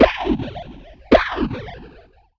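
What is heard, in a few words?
RAZE snare drum, drum loop; Big Fish Audio; Uncivilised G'rooves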